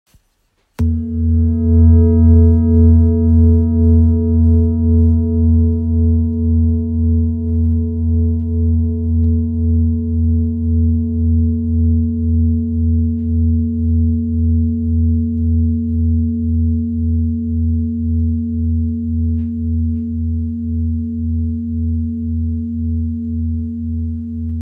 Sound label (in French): Bol ramgandi fa